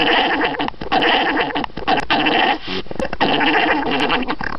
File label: orgle, alpaca